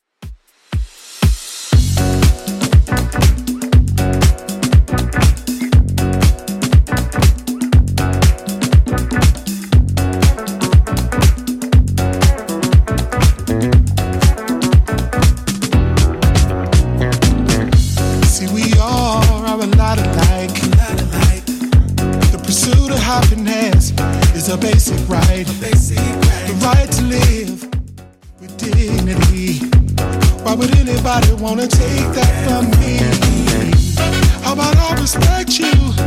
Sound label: 80s Redrum 120bpm